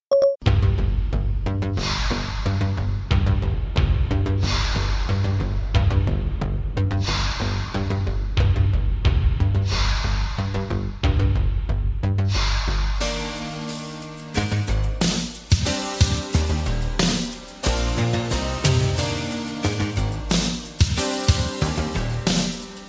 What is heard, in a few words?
victory sound; transformers themed sensory story